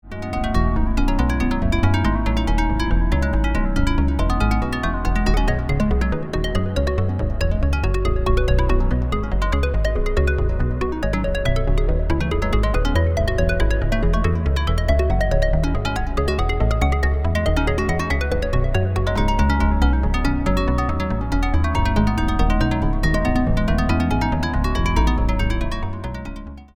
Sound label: solo Pitch CV octaves, string synth